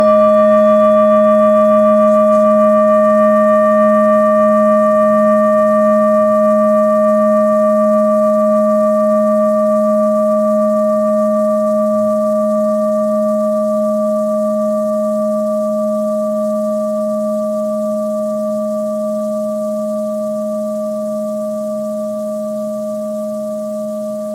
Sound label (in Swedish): sångskål, tibetansk; meditationsskål; klangskål; singing bowl; ca 14 cm i diameter